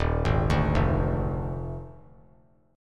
Eb Major 7th Suspended 2nd Suspended 4th Eb 3C 3F 3A 3D; arpeggiated; 3,3,5,3; EbM7sus2sus4